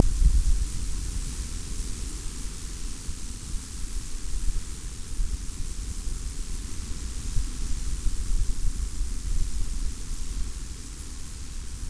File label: wind